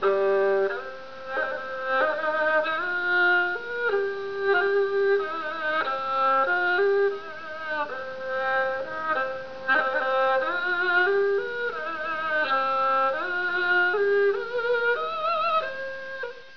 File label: zhonghu